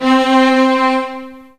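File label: C3 STRINGS; roland1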